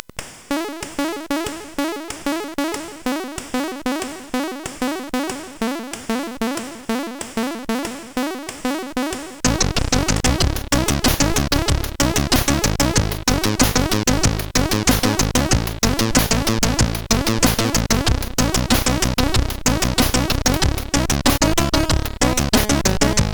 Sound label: Test Song sid CSG8580R5; Amadeus Meka Design